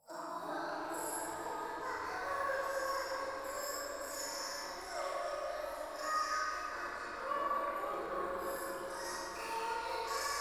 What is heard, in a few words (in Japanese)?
廃墟 低音喘ぎ 川のせせらぎ